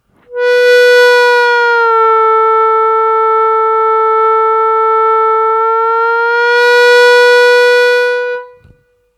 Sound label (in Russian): Играть бенд на два полутона вниз на третьем отверстии -3'', -3-3''-3 плавно, губной гармошки